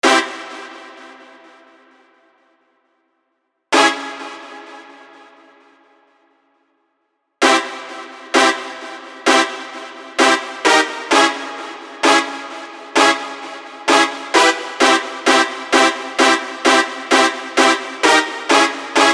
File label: Explosive